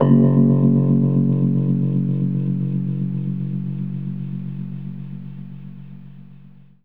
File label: F#, Rhodes, Keys; 3D Sound; 3D Keys VOL-1